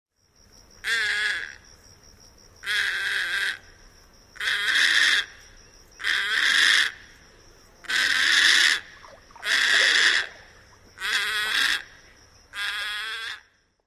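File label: typical hoarse calls, little blue heron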